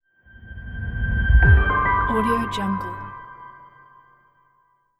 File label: bright technology logo